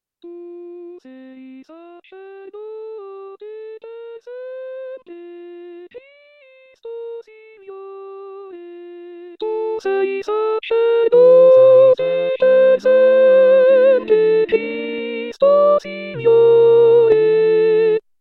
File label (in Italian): Tu Sei Sacerdote Tenori; anno liturgico